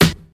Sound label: bright snare sample e key, steel snare drum sample tuned to the E note